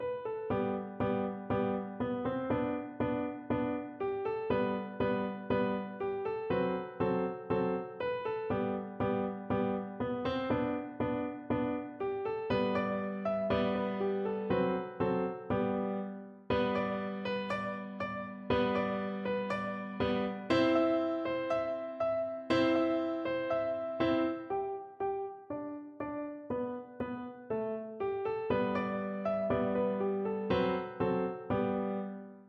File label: turkey in the straw piano